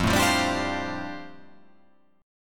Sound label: F 6th Add 9th F down, chord